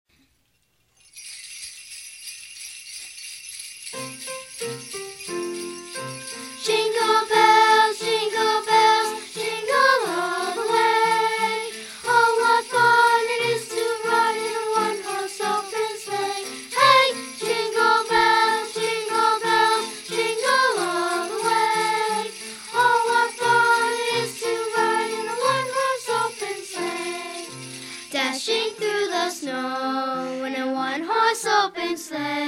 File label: Jingle Bells